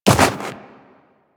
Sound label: Run Snow, Footsteps; DE; Warframe; Tenno